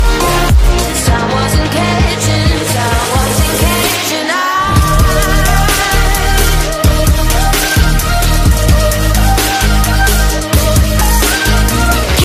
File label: hot electro